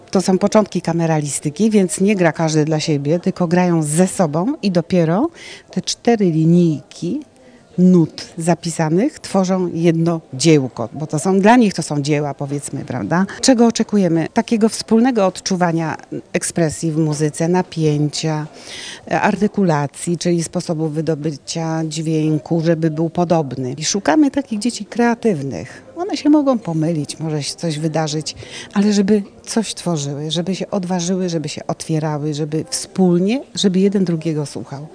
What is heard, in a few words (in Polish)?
pianiści